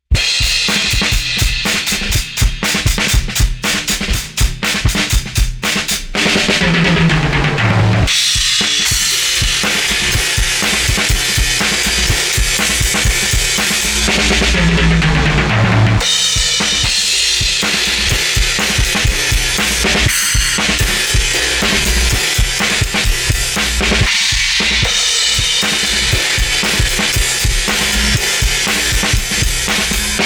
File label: TAPE EQ High Mid Boost; TAUPE